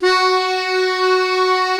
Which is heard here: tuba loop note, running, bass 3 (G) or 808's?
tuba loop note